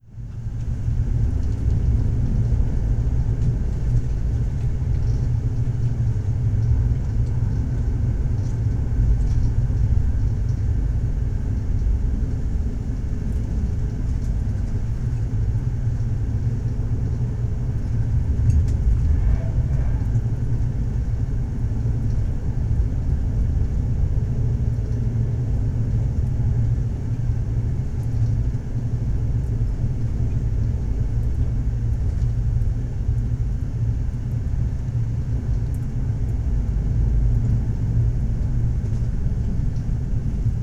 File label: train interior